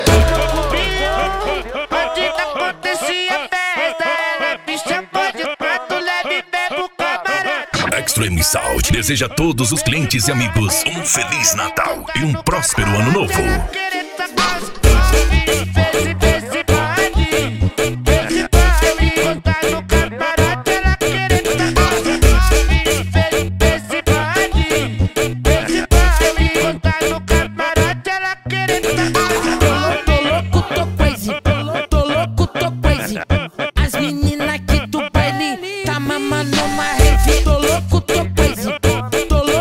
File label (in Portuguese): funk midback